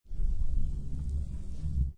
Billiard Pool Snooker Close Up Sound Of Ball Rollling On Felt